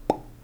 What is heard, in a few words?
crunch; Snake